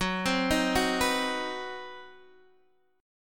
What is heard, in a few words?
C Minor Major 7th Flat 5th Gb